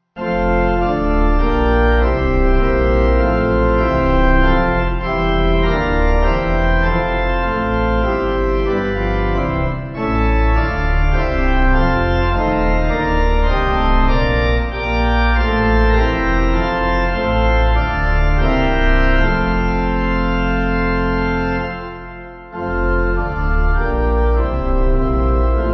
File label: Sweet Is The, Pipe; Woolmers